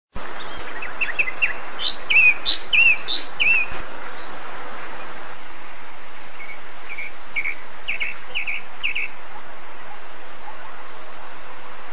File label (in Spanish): voz calandria grande